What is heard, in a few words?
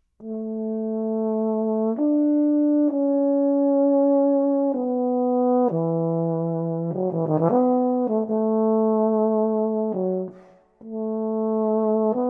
Euphonium; Exercise 8